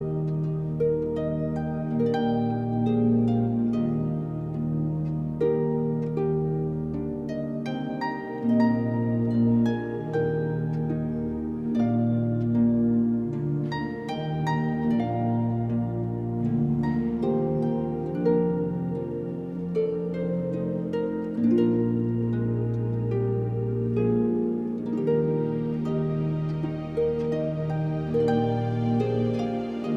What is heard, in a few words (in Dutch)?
panfluit, harp; Mozart